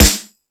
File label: Sluggo Drums, Drum Machines